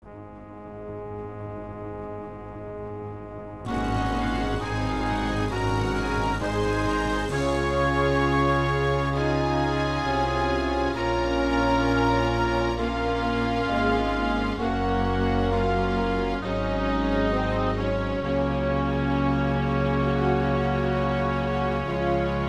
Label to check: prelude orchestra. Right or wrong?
Right